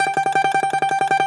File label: DOMRA, Russian Strings